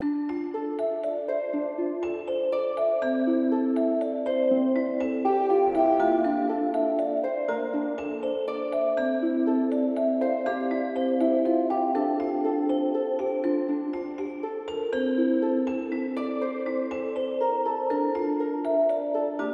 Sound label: Song Platformer; Octodad Dadliest Catch